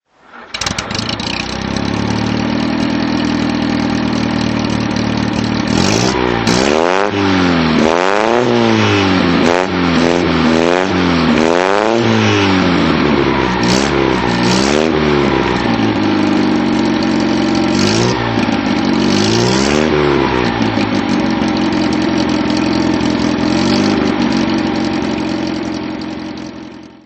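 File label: mg tb throttle; MG TA, 1937; Engine sound; V3D/ ClassicarImages; Marc Vorgers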